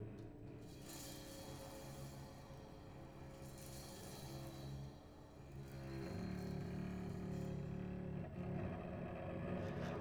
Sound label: Notes Inegales